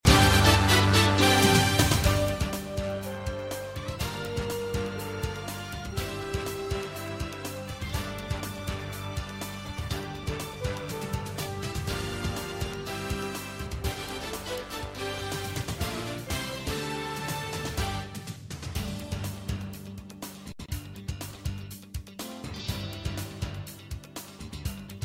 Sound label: Music, with fade; A1 NCC News